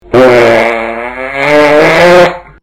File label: fart